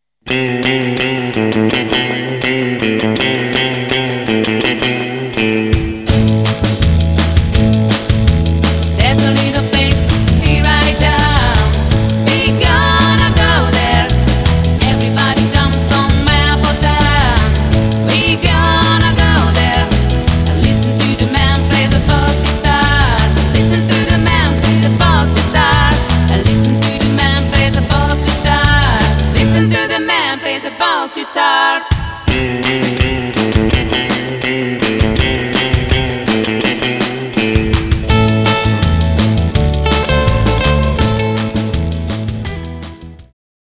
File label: Bossguitar